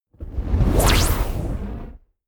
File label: sci-fi flyby plasma elastic